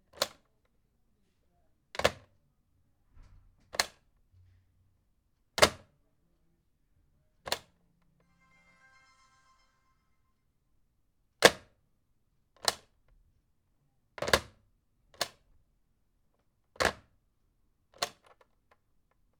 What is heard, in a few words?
Telephone Answer Pick Up Hang Up